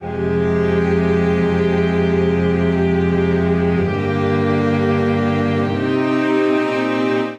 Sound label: Progression iv9-v-i-VII-VImaj7-v7 C Minor 130 bpm, string quartet; MIDI Clips; Crime Novel By The Fireplace; Ableton; Factory Packs; Spitfire Audio